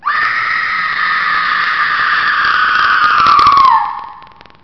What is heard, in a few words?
Scream